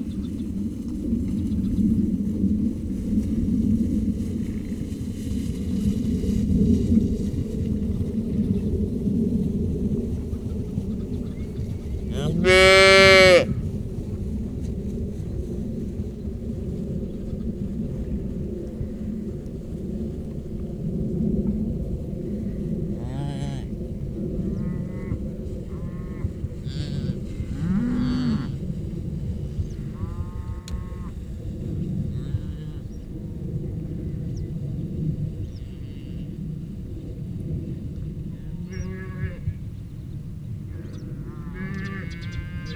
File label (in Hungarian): borjak felnőtt állatok vadlovak szaladnak, őstulok; Hortobágyi Nemzeti Park